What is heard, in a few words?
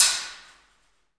PRC ALMWA, Aluminum Wall; Rhythm Section; FX Perc